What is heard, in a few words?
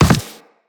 Operator Land Soft Default, Footsteps; Characters